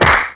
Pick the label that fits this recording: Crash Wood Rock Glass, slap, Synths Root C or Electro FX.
slap